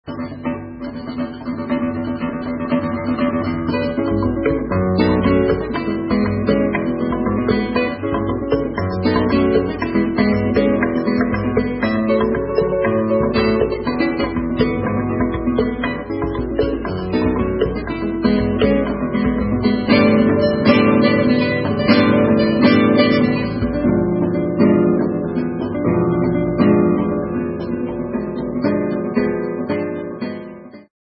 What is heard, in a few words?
Batucada